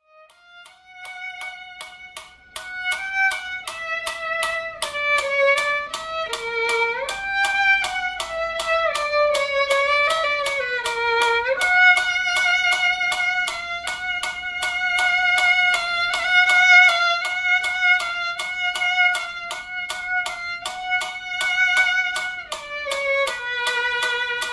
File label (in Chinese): 第四號學生協奏曲 第三樂章 小提琴 示範演奏; 賽滋; 新版 篠崎小提琴教本 第三冊